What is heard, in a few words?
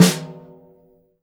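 Jazz kit snare drum, Drums Acoustic; AKAI; JAZZKIT 1; 90s sample CD; USB Soundscan vol.10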